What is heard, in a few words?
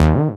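synthesizer Dance; Best Service Gigapack 1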